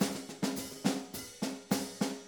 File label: 105 March Drums; Pop Rock